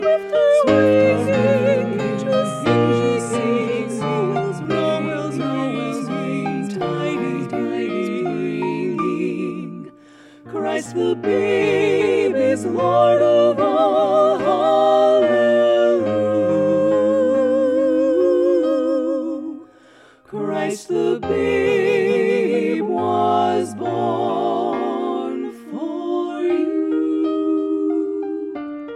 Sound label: Infant Holy Infant Lowly SATB